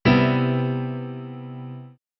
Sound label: chord; bsm aufnahmetest mustertest